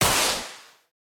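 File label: explosion; bomberman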